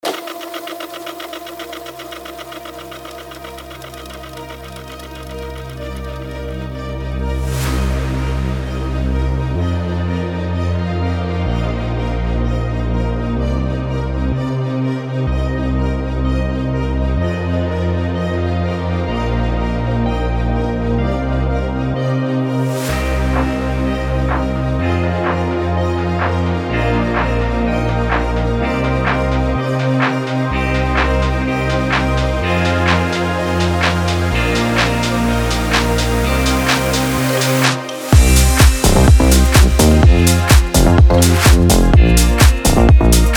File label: Instrumental Mix